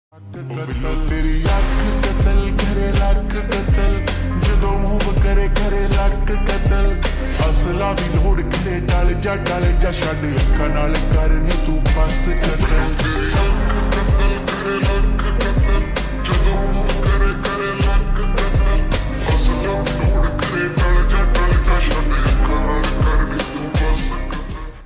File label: Slowed Reverb Ringtone